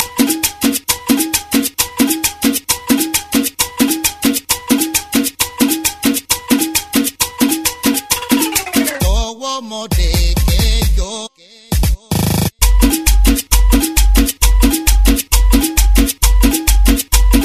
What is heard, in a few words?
Tribal; Chilango